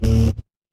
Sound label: feedback error